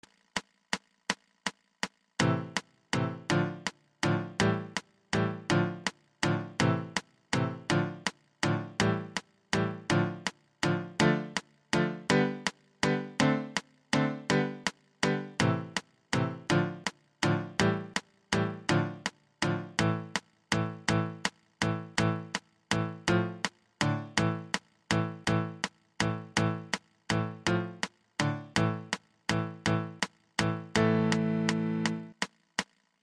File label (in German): klavier schneller walzer 6 8; klavier lernen fuer anfaenger lektion 10